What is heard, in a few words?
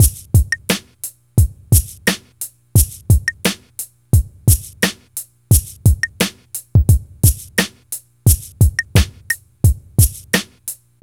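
DRUM, 87, R, Hip Hop and R&B; Best Service ProSamples vol.02; AKAI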